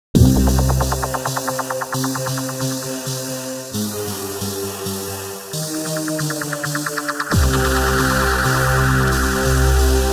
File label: Break box